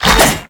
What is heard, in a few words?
Spin Whoosh, Weapons; Infested; Coda Caustacyst